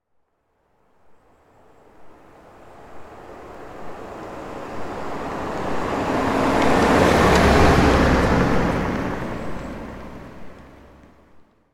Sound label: suv landrover freelander pass gentle